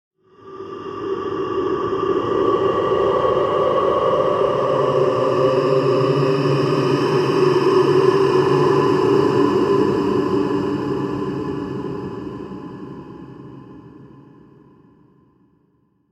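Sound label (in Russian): в стиле хеллоуинских ужасов полтергейст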